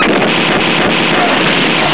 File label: fight